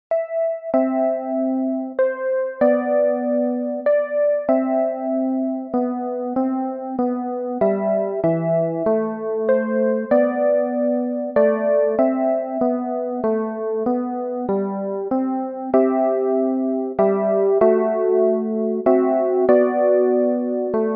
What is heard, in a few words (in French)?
berceuse bretonne